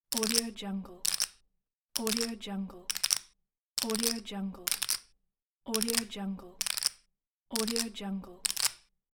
poker chips

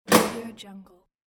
main electric power lever; applehillstudios

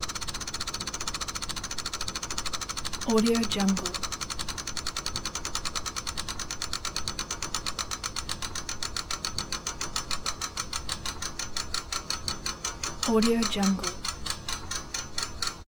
transporter

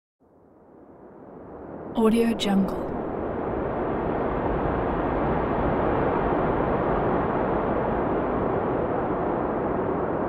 wind

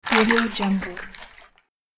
fish jump and wriggle

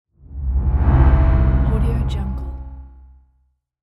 dark eerie whoosh

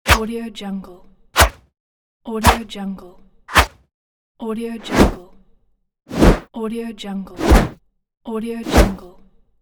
attack whoosh noise

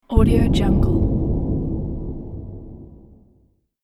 horror atmospheric metallic noise

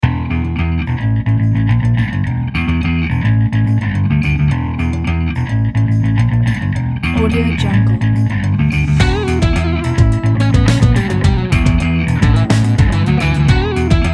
fun action

